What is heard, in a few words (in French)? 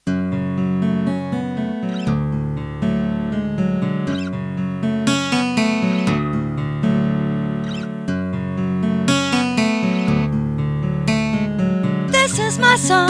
guitare; S330